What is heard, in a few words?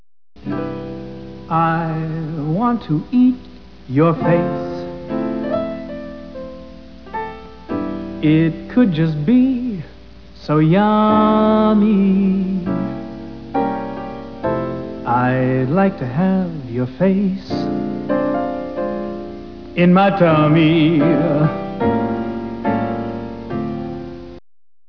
song